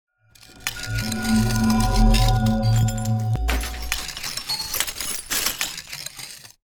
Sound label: Freeze